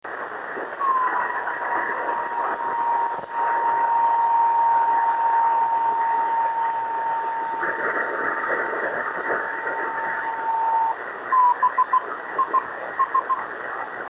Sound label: 26720KHz